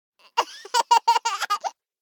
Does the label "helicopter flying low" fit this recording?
No